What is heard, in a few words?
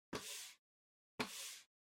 PC Mouse Move Far Soft Pad Hit